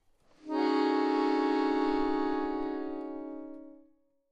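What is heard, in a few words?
Accordion Keys Tension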